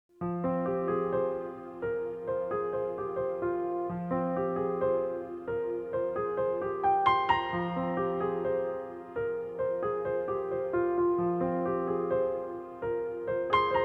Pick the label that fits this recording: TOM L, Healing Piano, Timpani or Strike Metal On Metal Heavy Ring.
Healing Piano